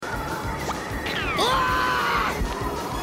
yell; Robotnik

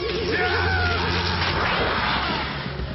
yell; Mandarin

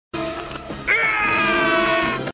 yell; Gripper

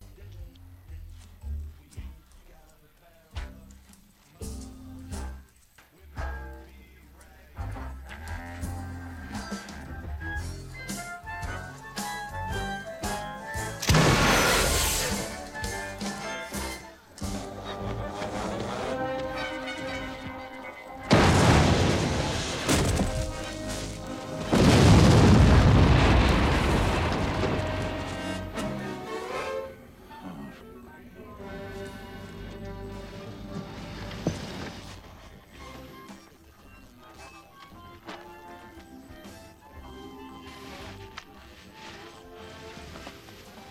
instrumental

